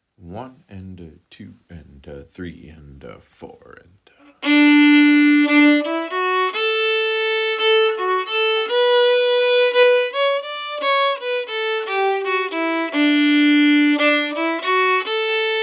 maison de glace slow, fiddle

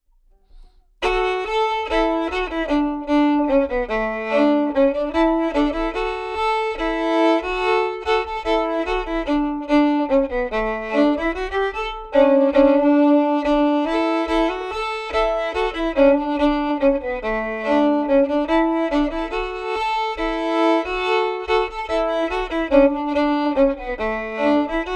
stam villlum down octave in d, fiddle; stam villum

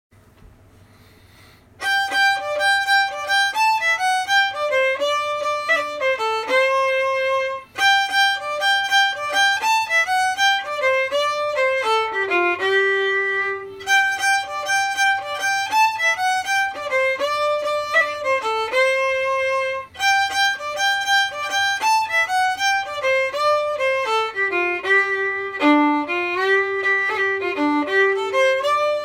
the kitchen jig, fiddle

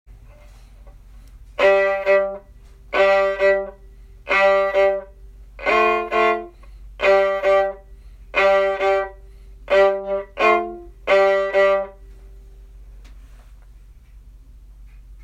kitchen jig groove, fiddle

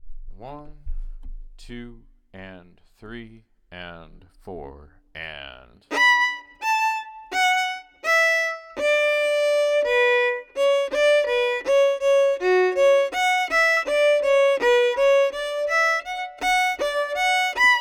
pete s breakdown slow, fiddle

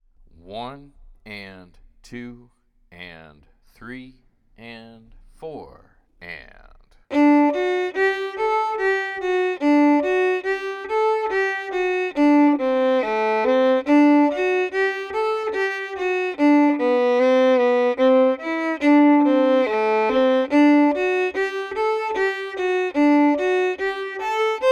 vladimir s steamboat slow, fiddle

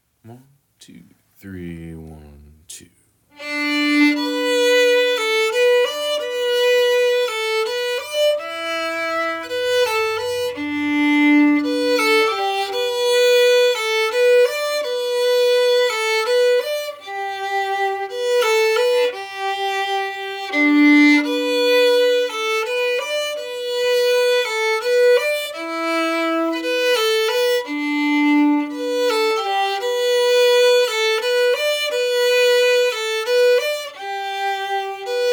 plain, fiddle

